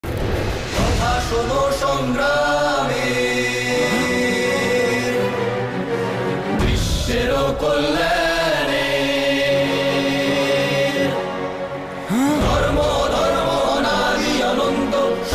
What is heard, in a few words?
title song bangla version; Mahabharat-Bangla-(TV-Series-2013-2014)